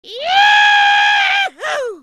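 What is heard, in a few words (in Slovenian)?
VRISK YAHOOU, scream "yahoo"; Efekti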